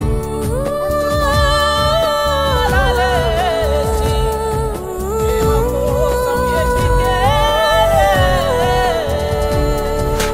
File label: Humming Ringtone